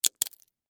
Crack Crunch Single, Texture, Crack, Crunch, Single, Penne, Rigate; Food; BlastWave